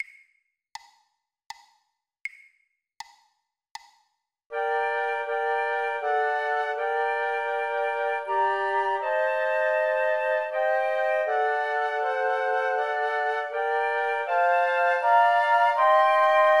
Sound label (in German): Flöte Klavier; The Coventry Carol; weihnachten